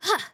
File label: Female attack; BulletHellTemplate